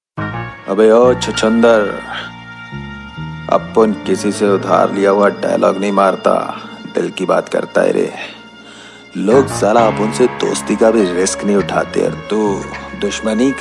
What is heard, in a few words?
punjabi songs